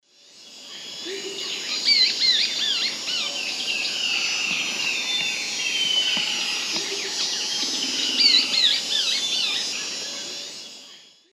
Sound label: Myrmotherula longipennis longipennis, alternate song, S. America Eastern Lowlands